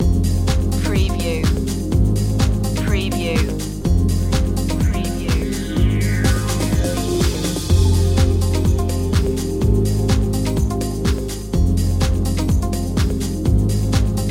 trip minimal loop

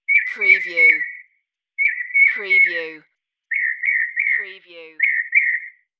Mocking Bird 2 Calls

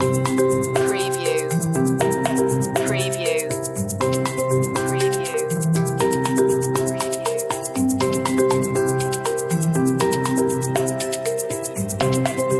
jumping jacks loop

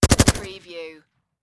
Machine Gun HKG36

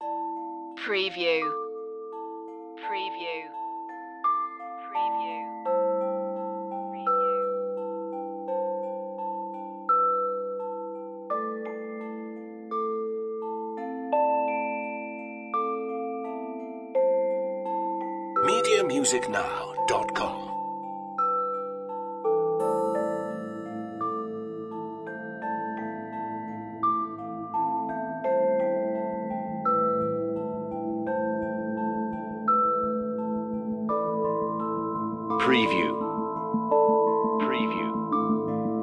waves of sleep no perc

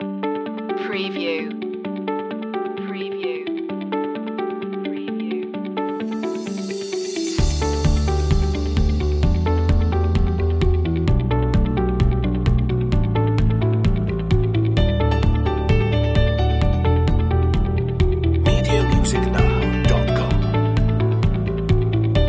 corporate technology

